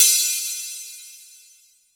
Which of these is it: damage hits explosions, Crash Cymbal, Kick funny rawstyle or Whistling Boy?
Crash Cymbal